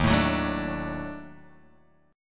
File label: Eb Minor Major 7th Flat 5th Eb down Guitar Open G